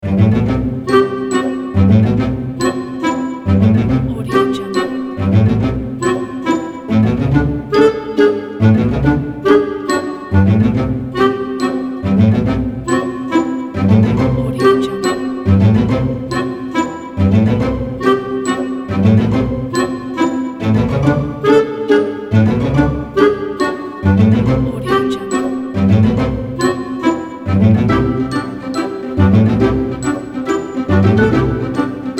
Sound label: pizzicato and woodwinds comedy cartoon